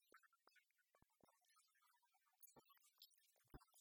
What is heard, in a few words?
polarity guitar KO